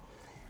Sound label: Birds